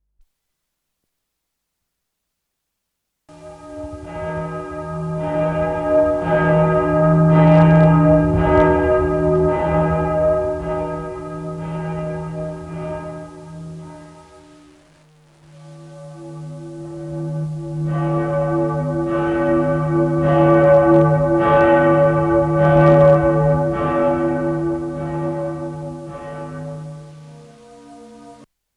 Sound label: swinging bell